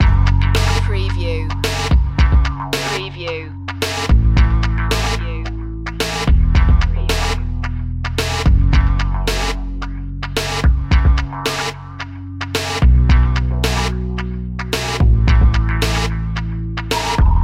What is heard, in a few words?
80s cop funk loop